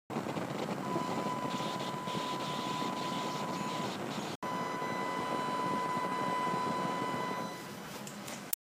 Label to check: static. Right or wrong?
Right